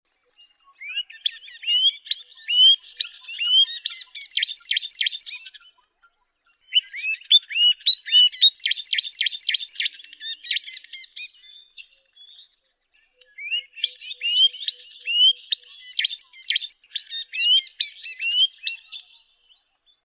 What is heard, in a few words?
red faced cisticola